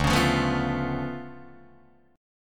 D Minor Major 13th, down, Guitar, DADGAD

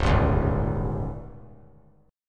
Db Suspended 2nd Flat 5th; strummed; JGuitar

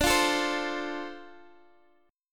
D-Minor 7th D Guitar Open D, chord

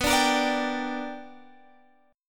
B Minor Major 7th Sharp 5th B down Guitar Open G

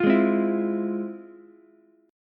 F Minor Major 7th, Ukulele, chord

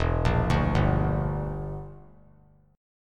E Minor 7th Flat 5th E; arpeggiated

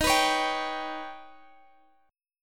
D sharp Augmented 7th D sharp

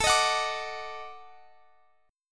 D sharp Minor Major 7th Flat 5th A

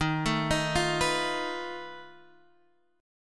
B 7th Flat 5th D sharp, guitar chord

